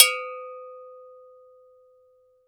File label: GANKOKWE, Drums&Percussion, Gankokwe Bells; E-MU Producer Series Vol. 7 – Old World Instruments